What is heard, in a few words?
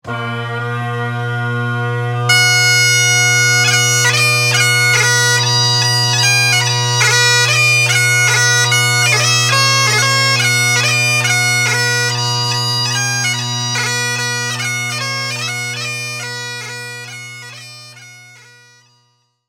Highland Wedding